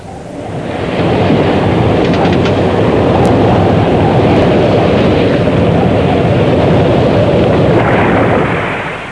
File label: Ocean Storm